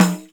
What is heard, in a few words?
Pandeiro Open; Multisamples; Digital Sound Factory Planet Earth